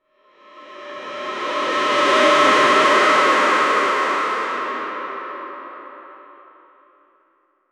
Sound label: instrumental woosh